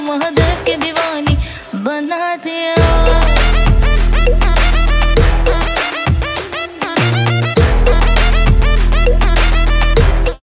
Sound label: hindi ringtone